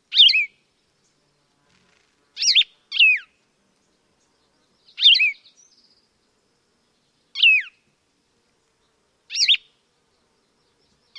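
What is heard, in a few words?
song, plumbeous vireo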